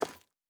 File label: Footstep Concrete Walking